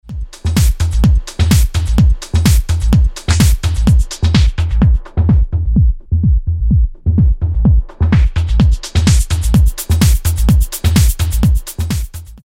Filter Low Pass

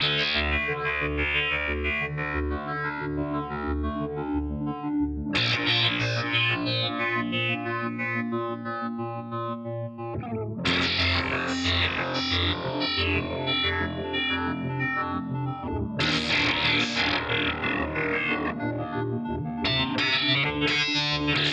Source Audio Ultrawave SA 250

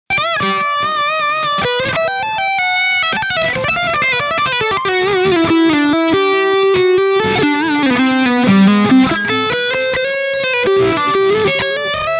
Leadline Overdrive Steg

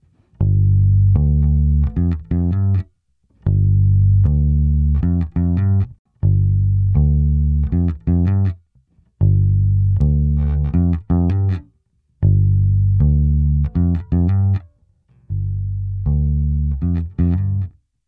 Channel E Bass; Heritage Audio

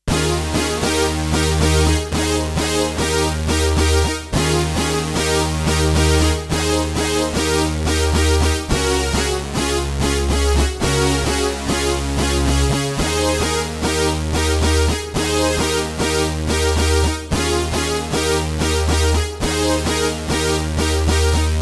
Emax Obi 8 Brass

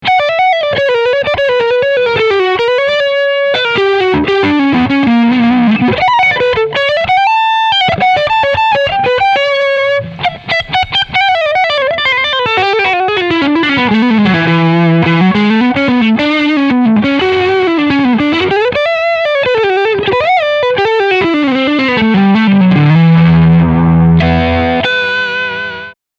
Leadsound

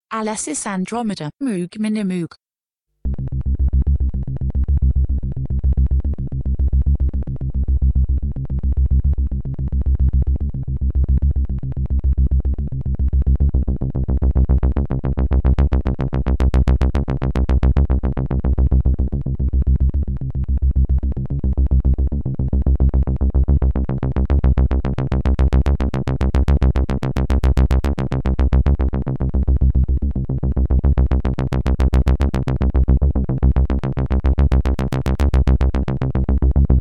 A6 Minimoog Env

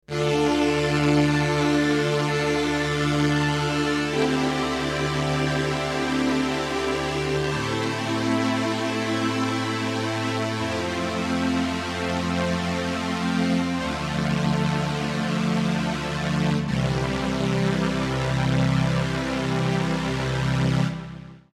VP330 strings

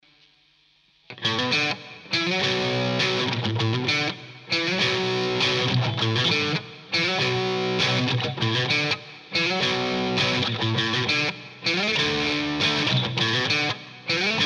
crunch

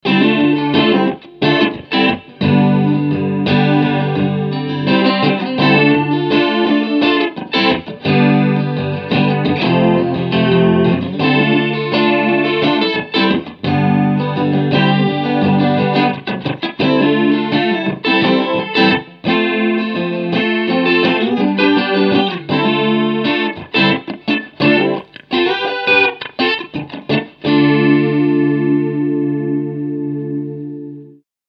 Modulation

